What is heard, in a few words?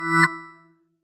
swap